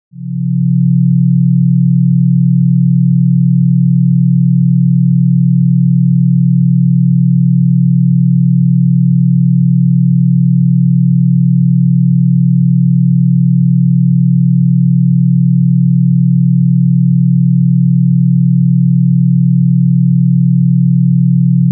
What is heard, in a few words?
Binaural waves